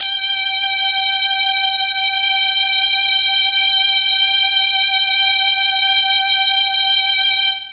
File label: G3 3 violins, Mellotron MKII; Mike Pinder - Mellotron Archive CD